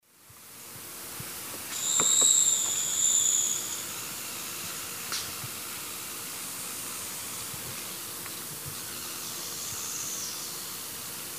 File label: Myiornis ecaudatus, calls or song; S. America; Eastern Lowlands